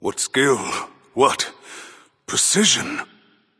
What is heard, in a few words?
death; smite; thor